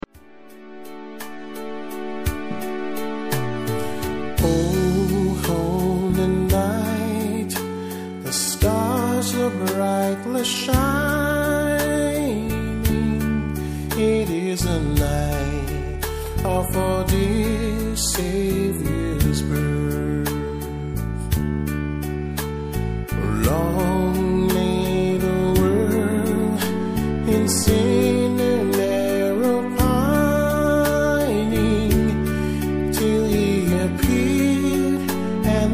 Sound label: o holy night vocal, steel drum band